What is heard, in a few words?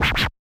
SCR, Yamaha DJX 2B